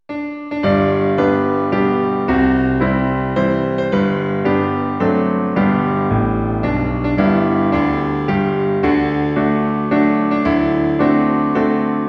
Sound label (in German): Zum Geburtstag viel Glueck in G Piano